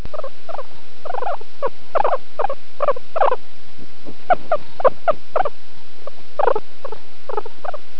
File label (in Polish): cwierkanie, Swinka Morska